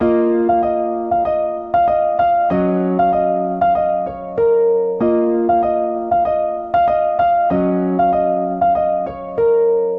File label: 096 minor piano melody; KEYS ORGAN PIANO LOOPS